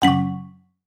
command wrong; CosmicRage

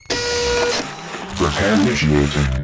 begin repair; vehicle

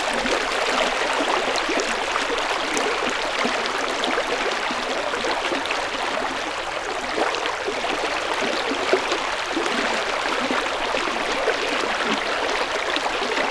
river, ambiances; CosmicRage

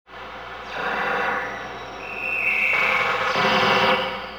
moon extend; CosmicRage

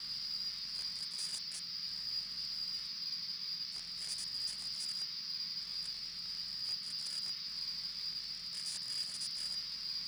vehicle no power, ambiances; CosmicRage

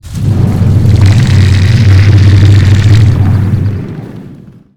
grav battle; star study

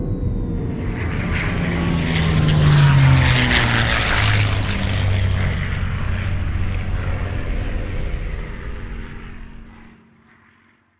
jet begin land; jets

